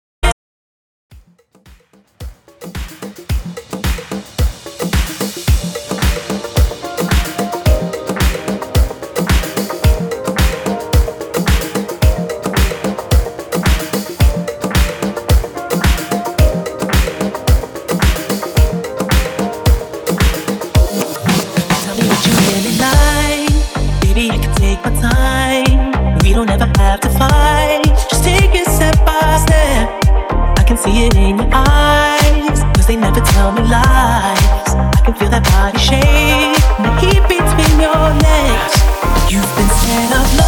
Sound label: BOOTYMIX 110 BPM